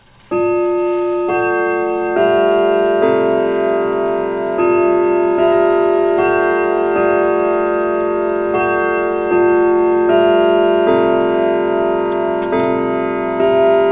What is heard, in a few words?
westminster, mechanical clock movements